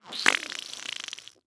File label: Cartoon Weird Noise Short Wet Fart